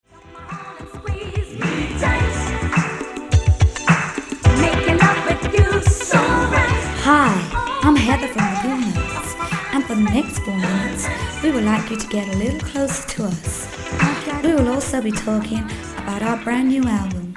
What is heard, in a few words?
Cool notes telefon